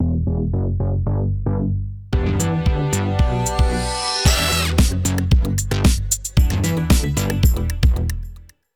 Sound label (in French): VIRGULE INSTRU; FETE RADIO 10 SEC